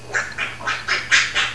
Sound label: QUACKS